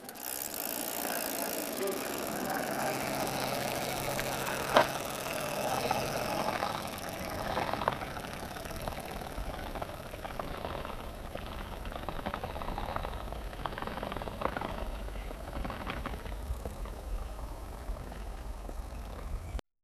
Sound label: bicycle wheel moving on